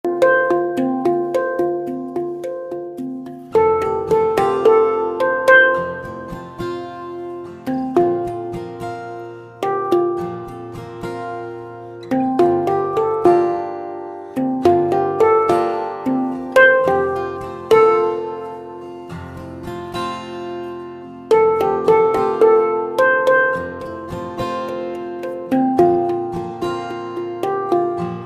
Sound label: handpan 10 notes; milky way